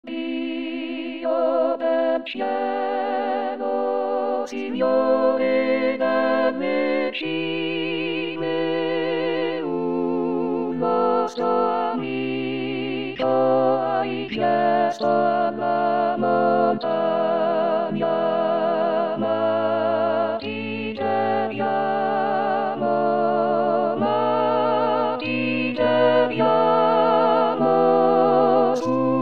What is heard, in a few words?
Tutti